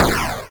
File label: hit